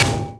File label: bullet impact metal